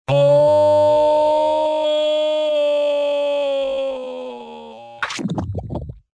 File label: BIG moan stretch